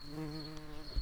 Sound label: bumblebees, Pascuorum queens